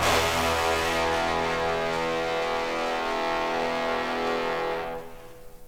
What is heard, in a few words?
Horns